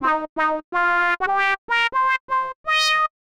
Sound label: 125 Fluty Lead C; Keys; PowerFX - Nu Jazz House